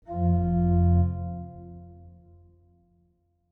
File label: organy F1; Apocalipsis